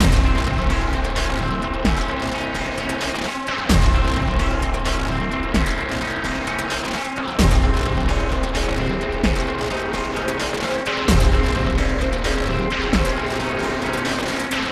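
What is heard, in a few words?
fight loop; transformers war for cybertron gamerip; COG FIGHT POST COG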